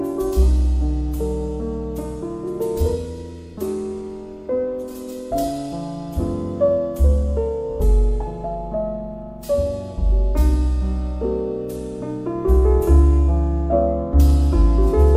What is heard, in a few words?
soft jazz